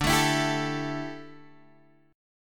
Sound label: D Suspended 2nd Suspended 4th D down Guitar Open G